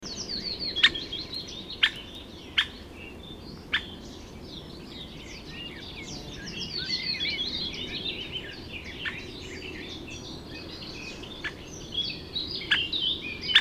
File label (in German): Weissrueckenspecht; Murnauer Moos; Susanne Rieck